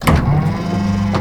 DOOR Elevator Open; mono; Universal Sound FX; DOORS & GATES

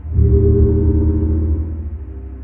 Sci-fi Cloak Loop; SFX Kit; Sci-Fi Loops

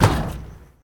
Street Ball Dunk Nylon Wood, Street Basketball; SFX Kit

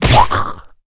zombie hit; DeadFright

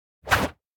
Whoosh Strike Air Whip Like Impact; Hollywood Edge; sound designer's toolkit v2

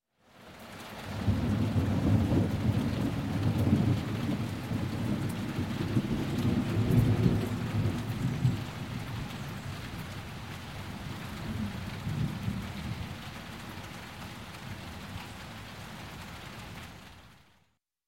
Thunder, Short Rumble With Rain; Sound Ideas 2000 Series

